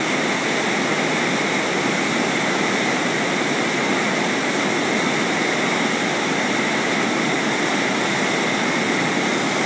river running

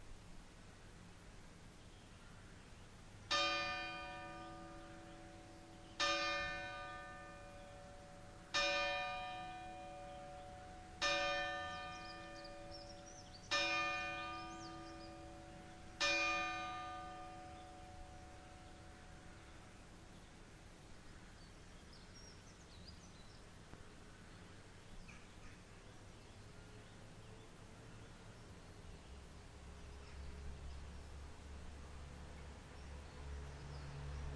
chime of the village clock

